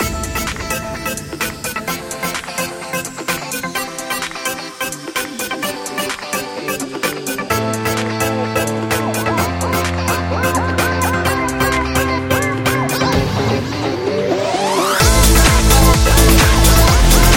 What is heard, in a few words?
Pop Fun; corporate music vol 19